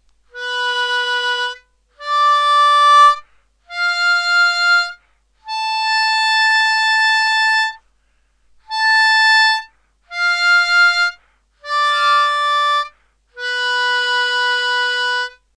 5 hole splits, harp

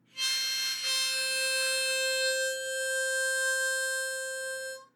Leaky Embouchure

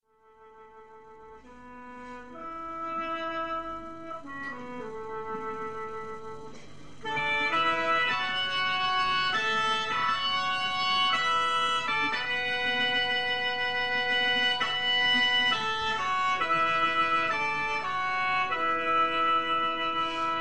Sheng

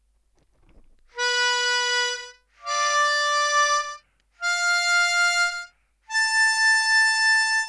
5 hole octaves C Harp

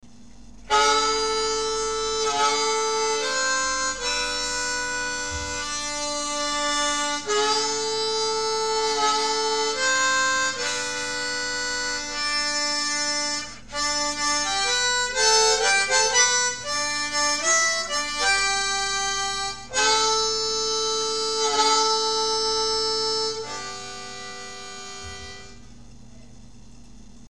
drone effect, harp

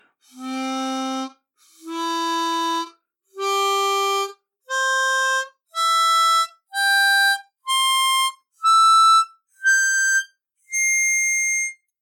Blow Notes, harp; Neuma

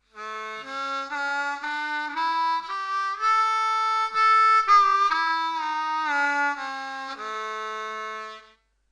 1st position low octave blues scale, harp

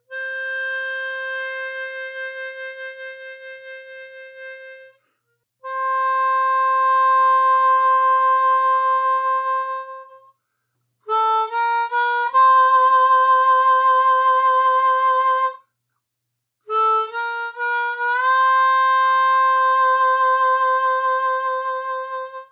Vibrato breath control, harp

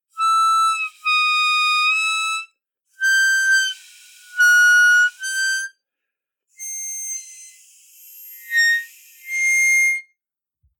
Blow Bends, harp; Neuma

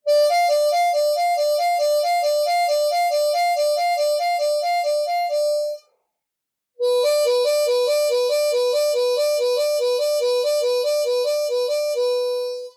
Standard trills 45 34 medium tempo, harp

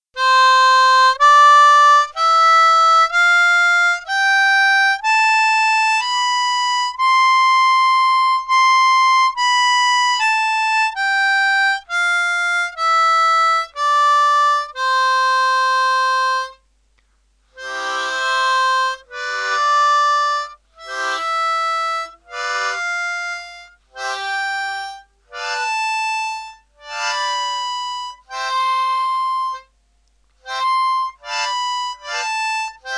major scale blocked and slapped

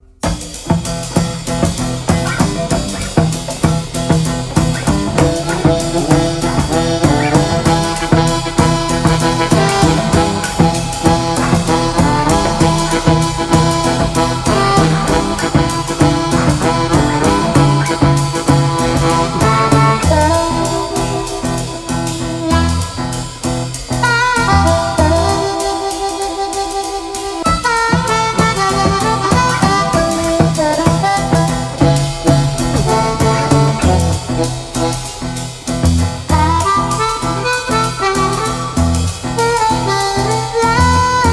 Cajon Blues Jam

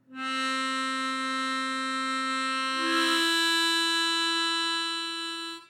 C Major Blow Hole 1 Into 2, harp

